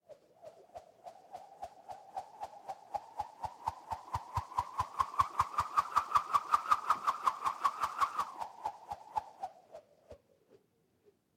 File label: Foley Cable Spin Overhead Whip Whoosh; Frogsong